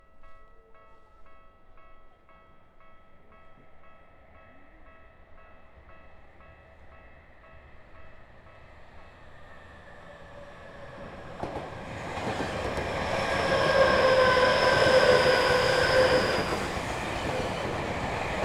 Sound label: train; Portacapture X6